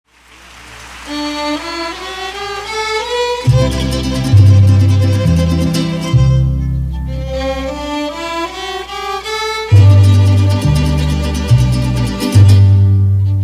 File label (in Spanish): minuete, mariachi tradicional; minuete a san antonio; mariachi tradicional los reyes ixtlahuacan col